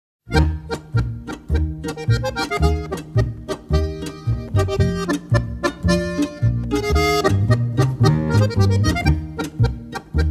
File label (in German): polka